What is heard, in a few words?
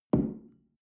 shell place on tablecloth medium; soundpool